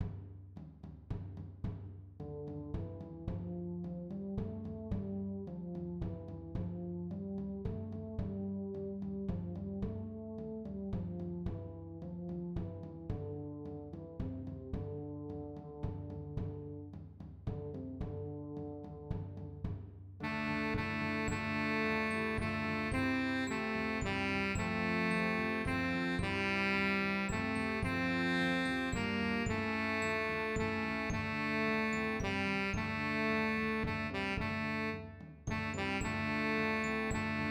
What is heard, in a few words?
sax